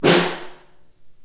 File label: SN2 Far, Snare; Ableton Foundation; Snare Designer